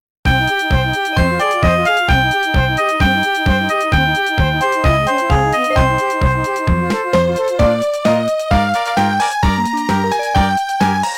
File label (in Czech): melodie